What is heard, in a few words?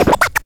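SCRATCH FILLS; AMG Megabass REMIX! VOL-1 & 2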